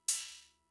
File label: Cymbal, Fricke MFB-522, Drum Machines